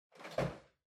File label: TV Hardware Far Pick Up